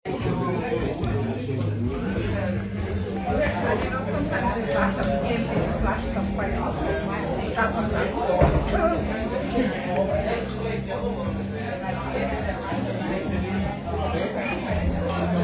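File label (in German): Pub Geräuschkulisse; Schottland; Uig; Carbost; Tarbert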